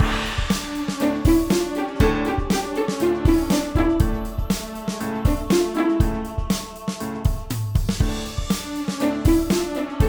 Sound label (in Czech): Předehra k muzikálu